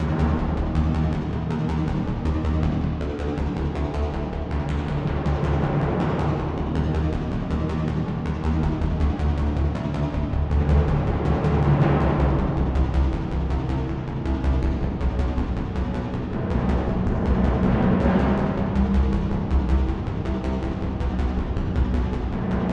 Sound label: Music Template - Tension; mass effect 2